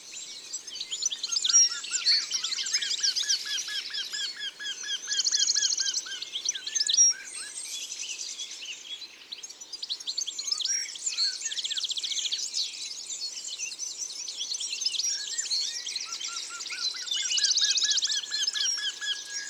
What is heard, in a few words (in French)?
Torcol fourmilier, Isere; Concerts naturels de l'Isere; Studio Les Trois Becs